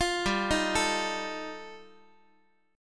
G 7th Suspended 2nd Sharp 5th G chord, Guitar; arpeggiated; JGuitar

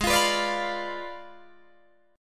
G sharp Diminished 7th G sharp, Guitar Open D

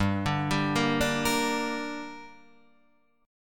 G Minor G down, chord; arpeggiated; JGuitar

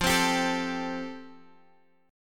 F Major Add 9th Guitar Open F; Fadd9; strummed

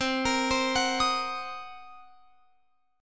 C Minor 7th Flat 5th Guitar Open F, chord; Cm7b5; arpeggiated

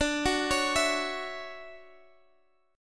D-Major 9th, D; arpeggiated

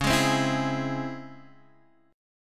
C sharp Minor Major 7th C sharp Guitar Open D

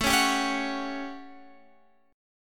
A 7th Add 6th; A7add6 strummed; Guitar; JGuitar

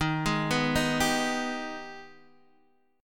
Ab Minor Major 7th

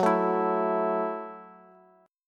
F sharp Minor 7th F sharp, Bass

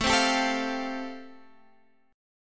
A Minor 7th Sharp 5th A, Guitar, Half Step Down

